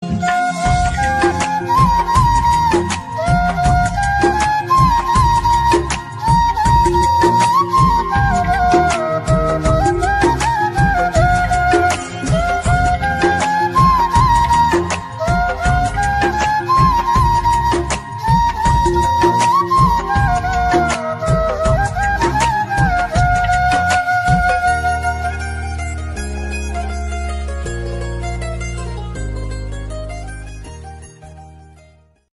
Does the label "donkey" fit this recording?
No